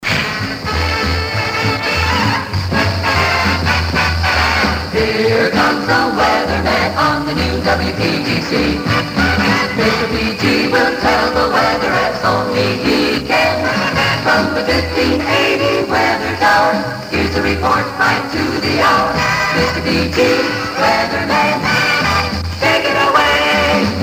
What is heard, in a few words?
jingles; from the 1580 weather tower